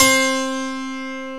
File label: HARPSICHORD